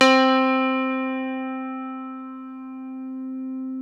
PNO, Electrics, Grands

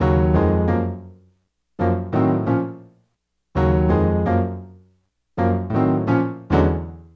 Piano, Acoustic Pianos; Sonic Foundry (Sony Creative Software); Mac Money

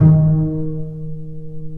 Bass Piz G Eb2; Digital Sound Factory Virtuoso

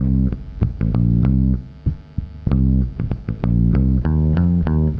BASS, BASS GTR

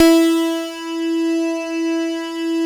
PERC BAS

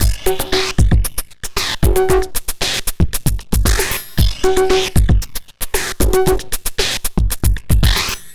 Drums Strange

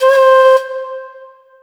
FLUTE R, Drum & Bass 160 BPM; Ueberschall; Drum & Bass Flash Trackz

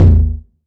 909 Tom Sweet; Goldbaby Tape808 & Tape909; The Tape 909

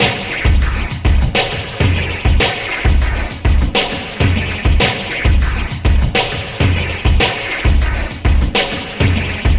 Loop; Sonic Foundry (Sony Creative Software); Esoterik Beatz

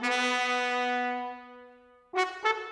Trumpet, Orchestral; Sonic Foundry (Sony Creative Software); Orchestral VOL-2; Modern 10

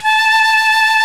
fife, British fife; Roland L-CDX-03 Disk 1

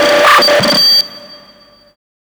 CYBERGUN L, Hard & Loud Techno

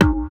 THROAT; Spectrasonics; Metamorphosis; THROATERS

